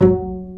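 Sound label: pizz basses; E-MU Formula 4000 Series Vol. 5; Protozoa; Proteus2 Presets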